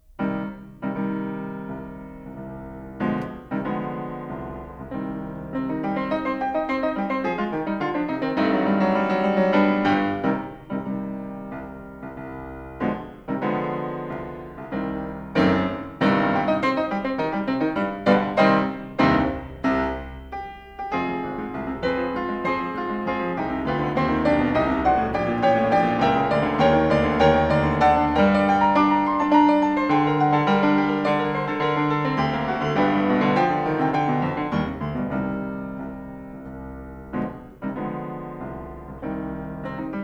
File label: Six Variations in F Major for Piano, Op. 34 Var. V. Marcia - Allegretto; Beethoven